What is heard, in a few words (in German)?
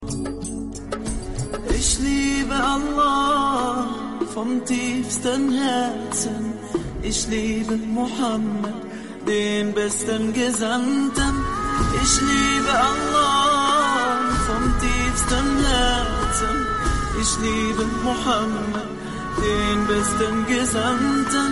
Arabisch